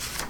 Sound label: book Page; Kerbal Space Program; KIS